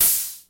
bleeding

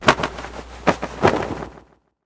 wing

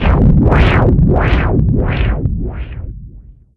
abyss

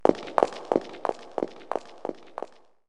stair

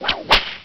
whip

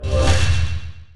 smite

